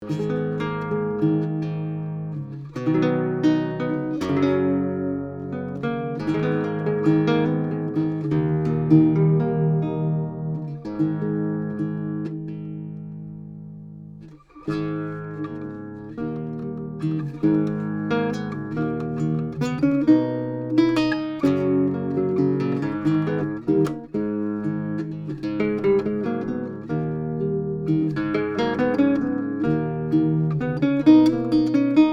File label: Packingtons, Baroque Guitars; Mauchant; Mini K47 mics into a Trident 88 recording console, using Metric Halo ULN-8 converters